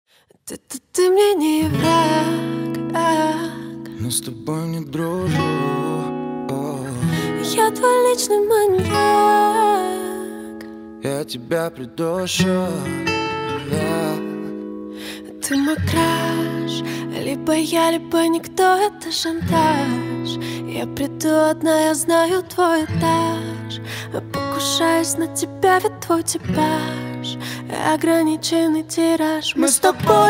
acoustic version

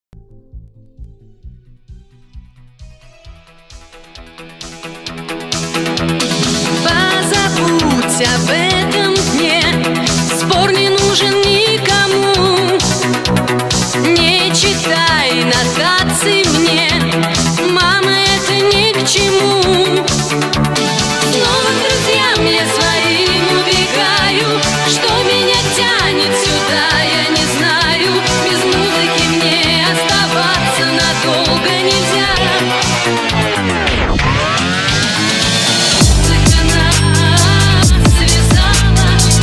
Phonk Remix

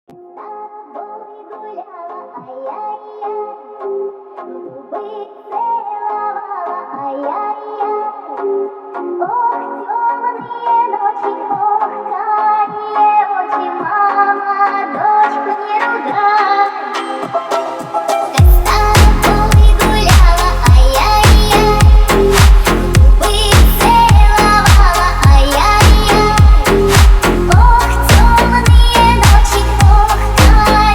Phonk Remix

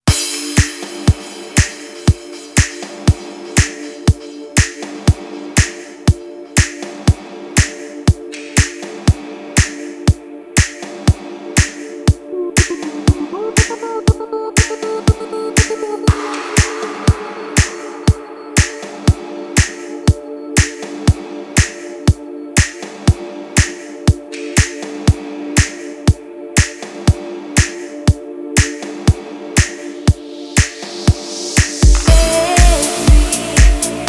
extended mix

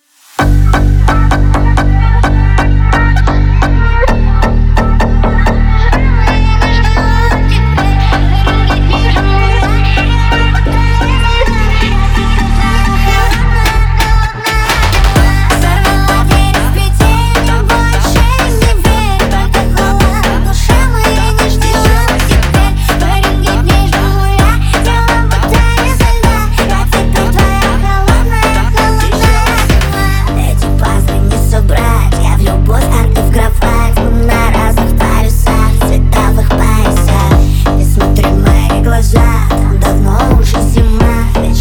Phonk Remix

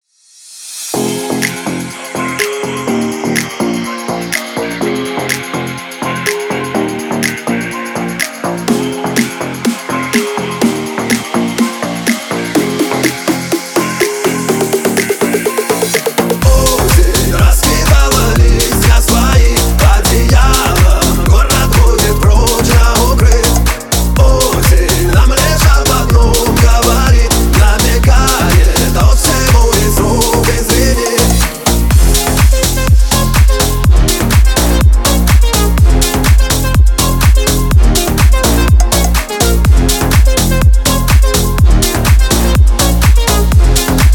Dance Mix

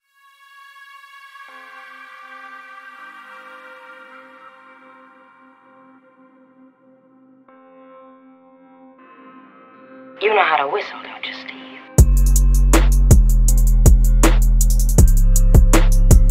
type beat

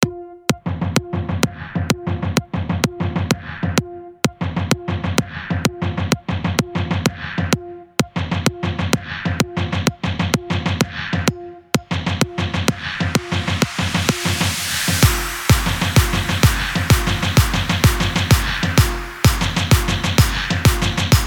flute

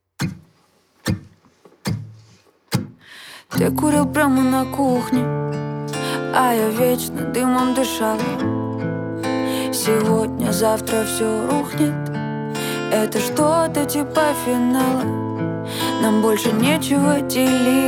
acoustic version

